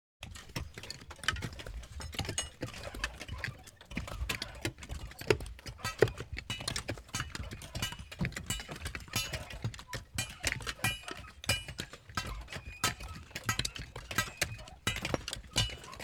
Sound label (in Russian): Zakljuchennye kopajut